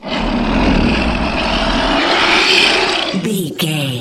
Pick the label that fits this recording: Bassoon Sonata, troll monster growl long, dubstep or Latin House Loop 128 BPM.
troll monster growl long